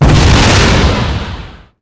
Small Exp, Explosions

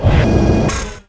turbo; Quantum Redshift

